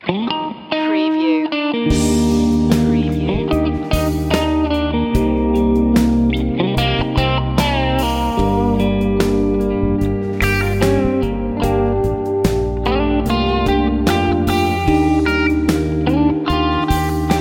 strings and melodies

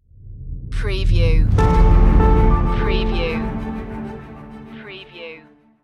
acid rain stinger

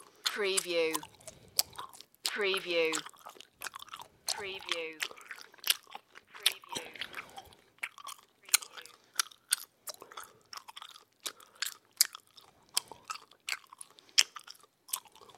Chewing Gum

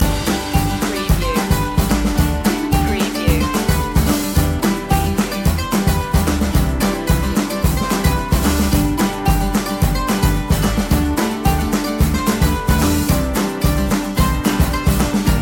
hay picking loop

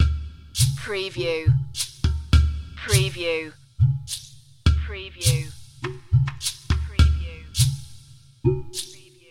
mountain fog loop

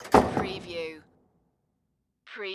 Gymnasium Door Close

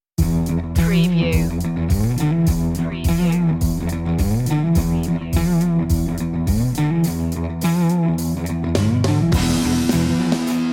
no high guitar

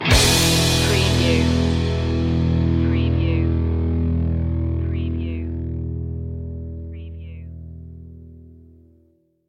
driving fun stinger

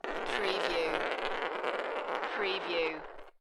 Marble Small Rolling On Floor

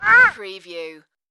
Duck Call

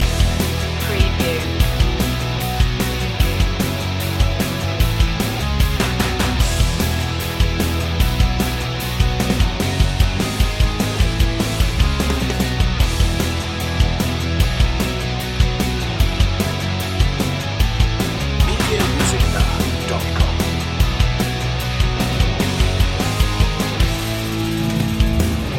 rockin loop

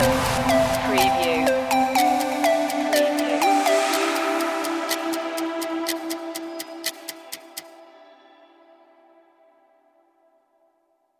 countdown loop end; media music now

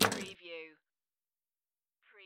Pinball sound, ball coming to stop

